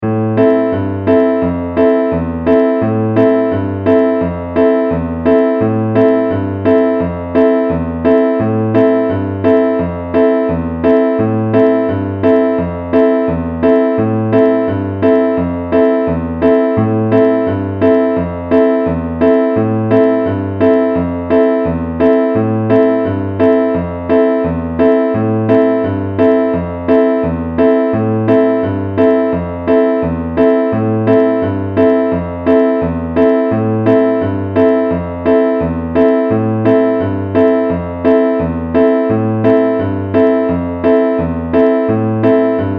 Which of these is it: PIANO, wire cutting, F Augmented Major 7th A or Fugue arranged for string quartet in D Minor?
PIANO